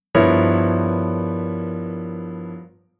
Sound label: Chord Tension Dominant V7 9 11 13